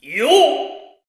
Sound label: "Yoh", Vocals; Roland BK-5